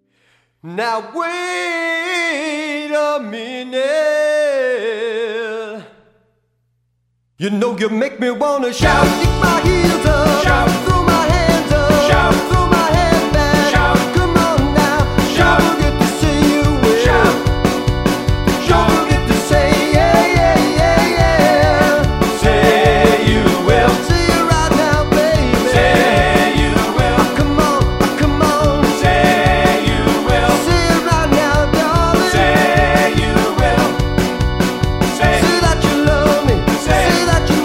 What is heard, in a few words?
shout